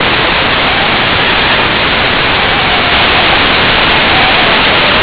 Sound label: cry